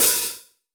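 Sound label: R8 open hi hat short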